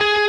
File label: prebend full Left-hand vibrato